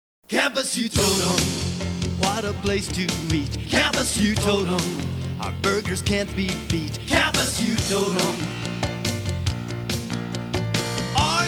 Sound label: ad music